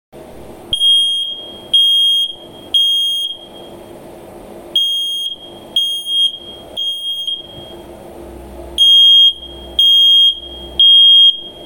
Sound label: Alarm tones 报警信号; Guangdong Isafenest